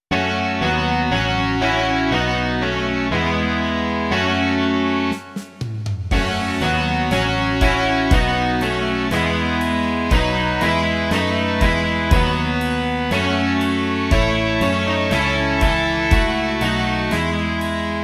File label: rock